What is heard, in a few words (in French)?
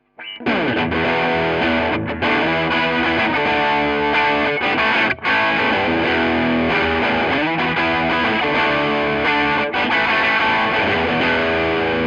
tele chevalet sature; fender road worn